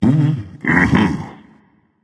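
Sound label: nonverbal agree; smite; voicepack; merlin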